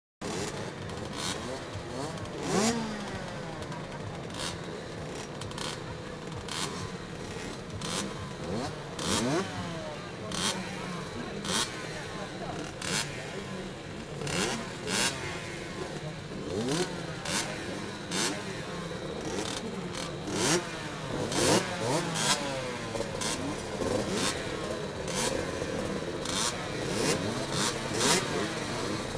motorbike, street traffic; best service Blue Box

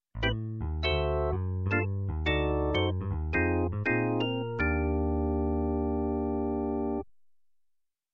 Organ jazz Bridge With Bass, Music; Sound Ideas 2000 Series

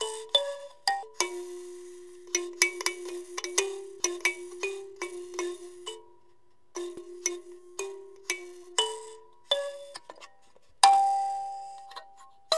Percussion Native Metal Pluck; SFX Kit; Foley - Percussion

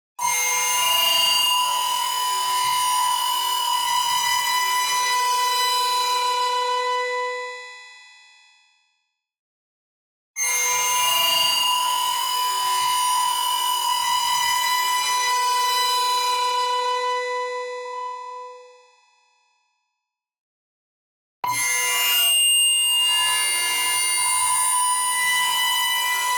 PING Harsh Light; boom library; Neon

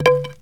Baseball Bat Fall

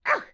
grandma knife waaa; Blind Drive

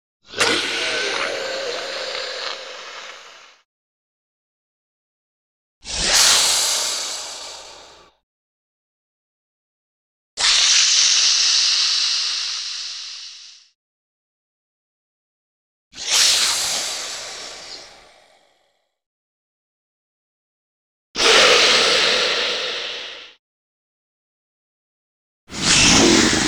Sea Serpent Vicious Hisses; 10 Versions; Sound Ideas; Series 2000

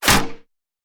Bullet Impact; Sound Ideas; Guns

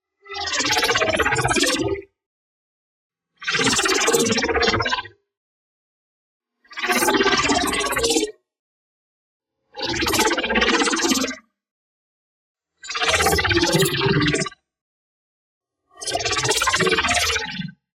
ABILITY DEACTIVATE Data Stream Pulse; boom library; Matter

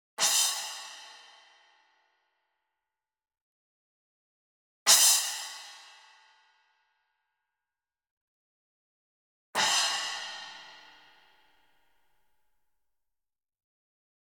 CYMBAL Splash Hit Soft Beater Near; boom library; Cinematic Strikes Construction Kit

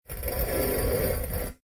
Stone Lid; Blind Quest - The Frost Demon

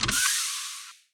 pickup health

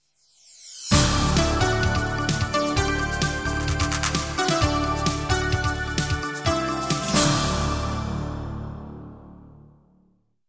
jingles; 90 DDD Jingles

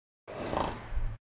Animal effects snorts grunts farm ambience

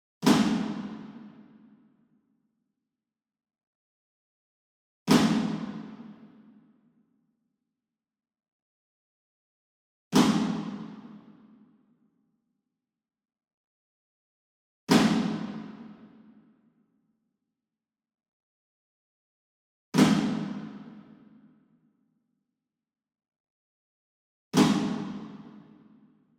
SINGLE DRUM Shime Daiko Flam Far; boom library; Cinematic Strikes Construction Kit

